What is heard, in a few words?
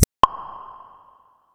Pop Up; Meitu; V4